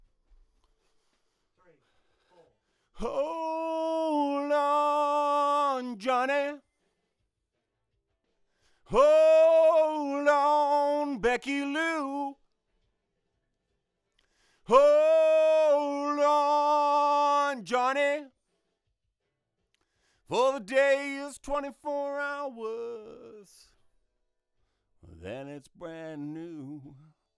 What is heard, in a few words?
Vox